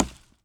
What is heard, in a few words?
Tree chop